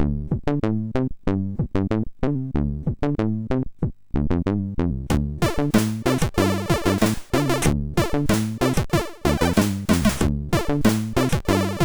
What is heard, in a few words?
MOS6581R4, sid